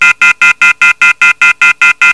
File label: off hook alert